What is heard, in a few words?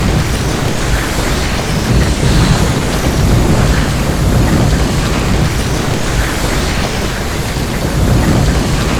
hurtbeam loop; odin